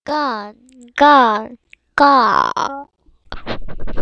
gun

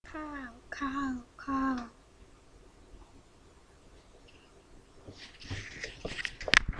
cow

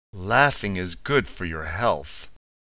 laugh

